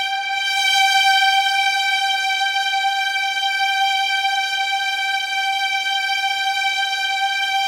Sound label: Long string; Disco House